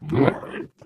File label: ballbot yes